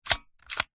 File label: leisure toy gun plastic slide pump action